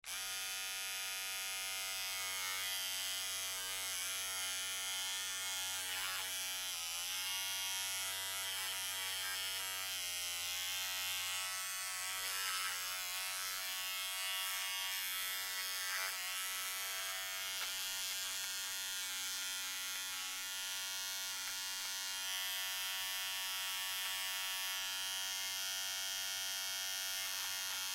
Razor Electric, Razor Electric Motor On Run Off Shave; Face Beard Appliance; Household; Blastwave